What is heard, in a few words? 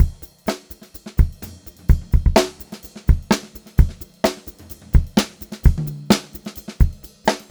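128 groovy variation 5, funk groove drumloops; USB Soundscan vol.38; AKAI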